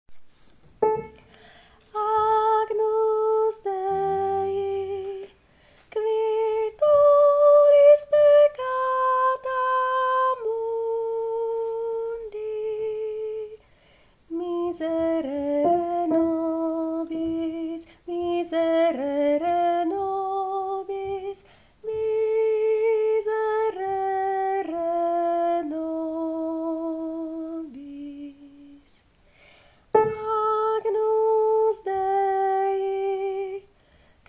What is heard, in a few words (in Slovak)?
sopran; Agnus Dei; Soprán II